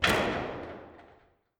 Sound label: start Bonus Game Press; netent